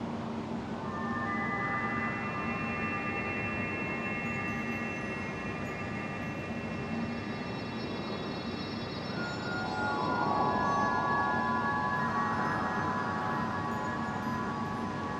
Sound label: Maze Ambience